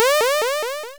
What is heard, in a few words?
win